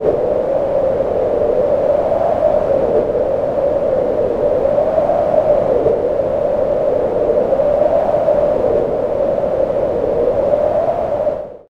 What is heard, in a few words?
multiwind; 15-wind; nyquist